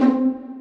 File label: Tick; Neverball